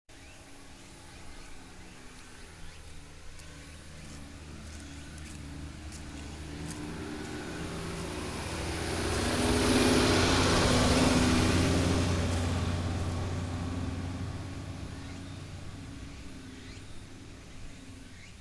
car

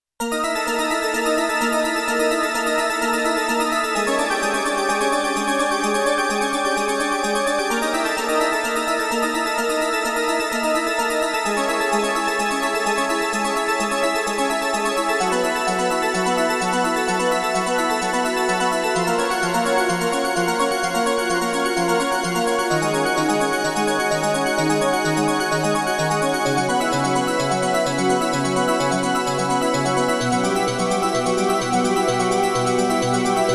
tense

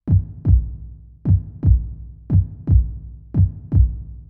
heartbeat

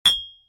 glass

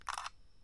camera

